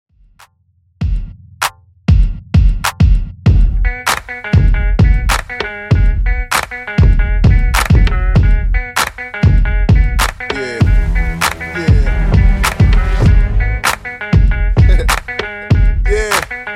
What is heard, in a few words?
Hiphop DJ Friendly Redrum 98bpm